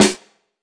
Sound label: classic hh Snr; STYLE KITS (Hip Hop, Etc); Classic Hip Hop Mega Kit